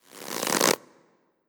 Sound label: Attack Stretch; Tracker